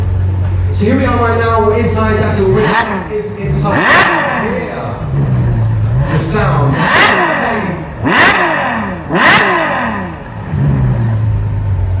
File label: Ferrari 812 Superfast engine noise